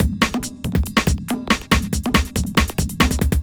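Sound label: industrial 140 kit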